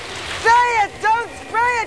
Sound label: spray